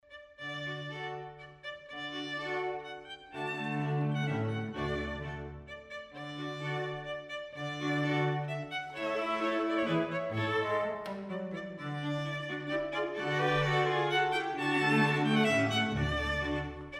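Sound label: quartet; viotti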